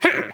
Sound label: Bee Vocals; Bayou; Target Hungryfrogs